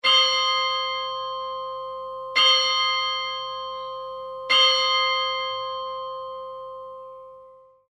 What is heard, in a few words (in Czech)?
zvon; zvonohra